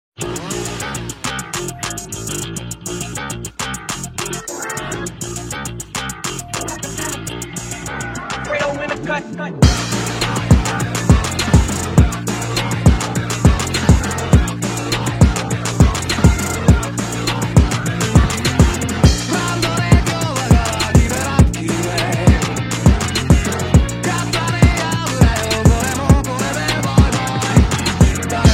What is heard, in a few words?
Trap Remix